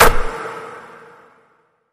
Three Pointer Swish

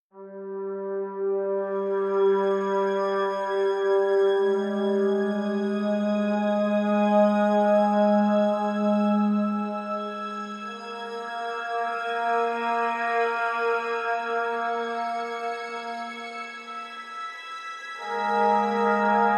No Vocals

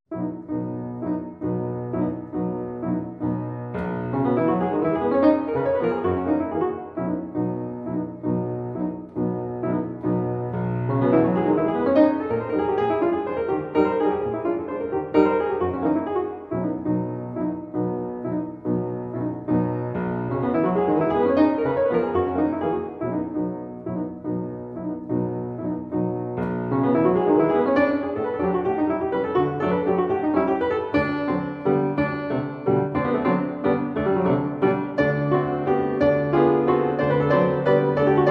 Mazurka in B major; Op 41 No 3

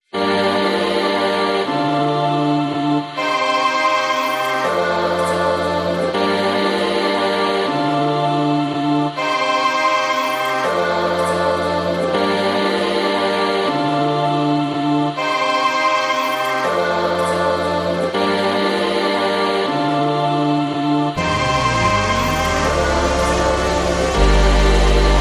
No Perc

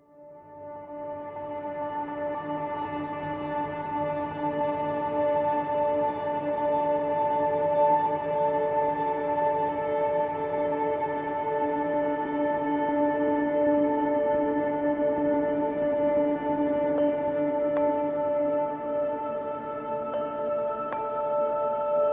No Plucks

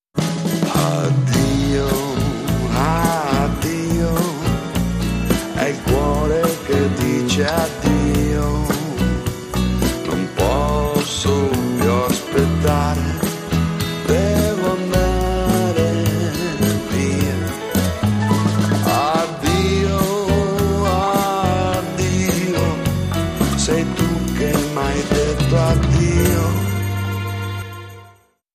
Male Vocal

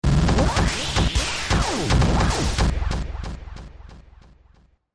FX Startin Up

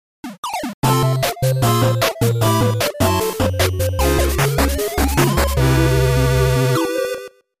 Survival For The Silence Jingle

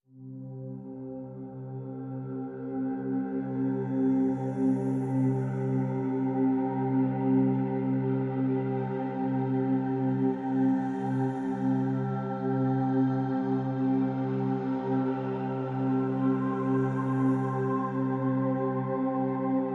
Pads Only

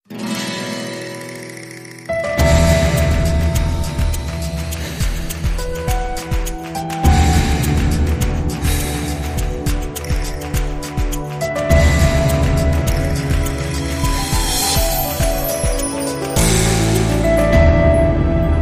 Spanish Heart Beat

